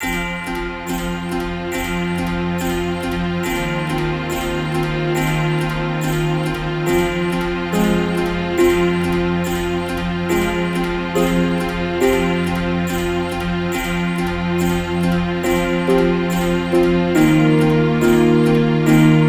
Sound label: Intro Backing Track